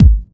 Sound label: hot bass drum g sharp key